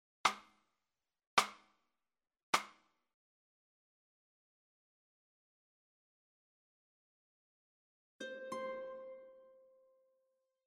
F

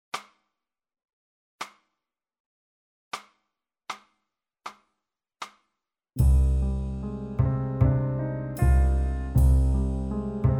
Cm trio play referencia armonica

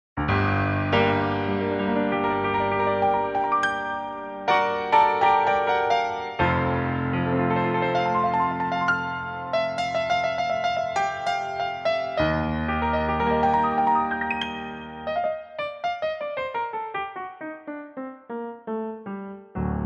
Gm piano play

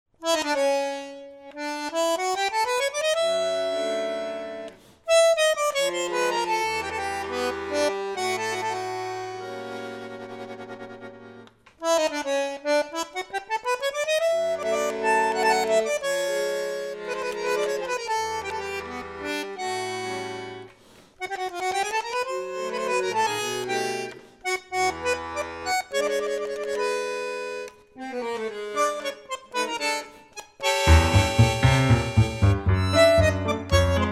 flute

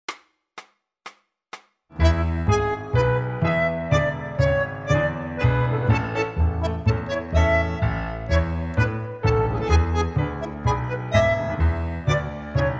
A play flute reference

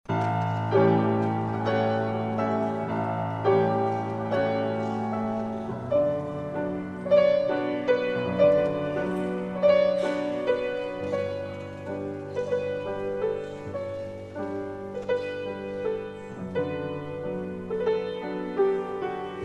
reference cello; Dvorak; arr. by F. Kreisler

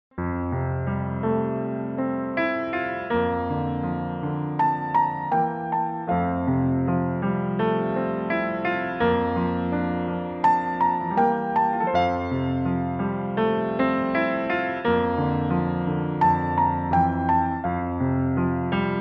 piano

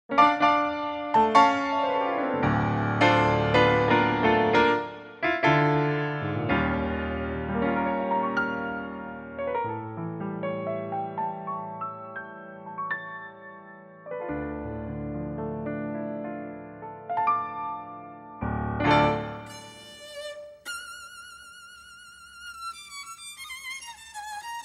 play flute melody

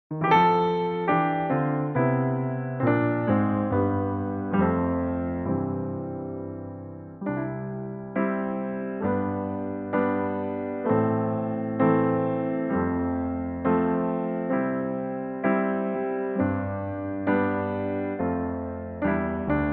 C piano play

